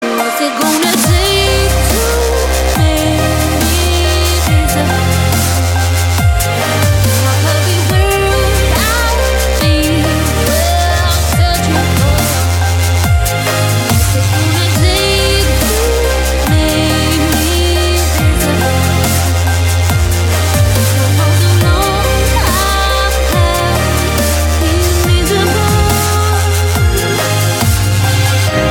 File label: dubstep mix